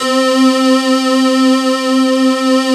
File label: CAIRO C4, SYNTH; Q Up Arts; Dream Experience